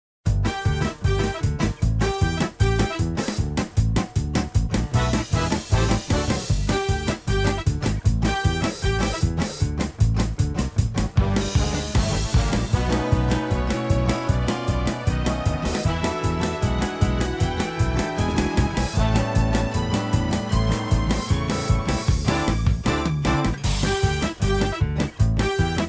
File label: jingle; china dine-ah